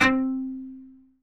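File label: C3 SPICCATO, CELLO; Northstar; New Gold VOL-2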